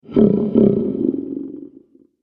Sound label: Massive Dragon, monster; Monster Sound Effects; horror sound effects